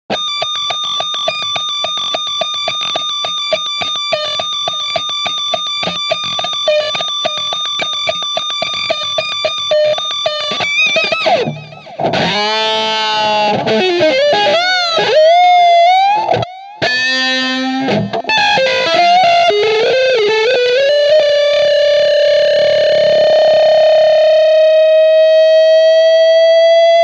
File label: raw solo